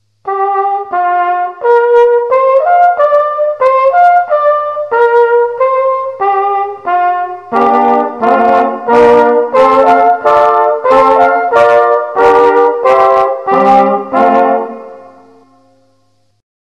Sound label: test music